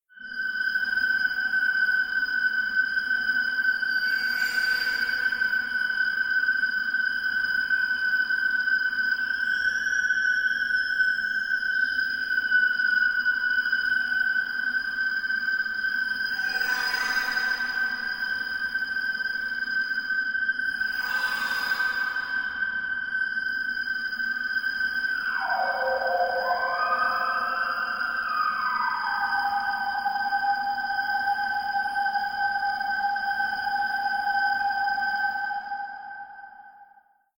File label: Otherworldly Ambience SciFi Metallic Vocal Forbidding, Otherworldly Ambience Metallic Forbidding Drone; High Pitched Bed Scary Ominous; Elements